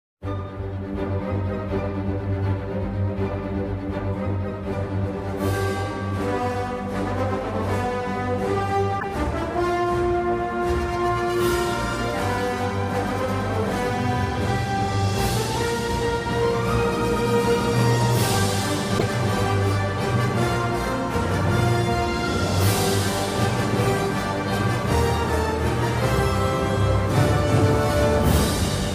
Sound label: logo animation and fanfare; Marvel Studios; since 2016